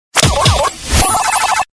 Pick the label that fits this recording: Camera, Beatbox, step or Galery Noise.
Galery Noise